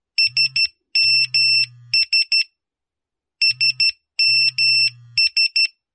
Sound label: nokia sms; nada dering nokia jadul